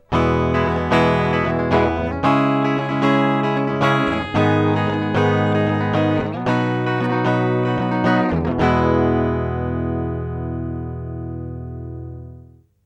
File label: bypass piezo enhance jumbo standard; ac2